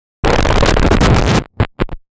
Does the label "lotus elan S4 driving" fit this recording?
No